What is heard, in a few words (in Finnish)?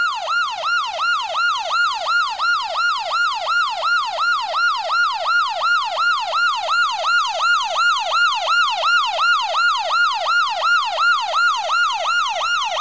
sireeni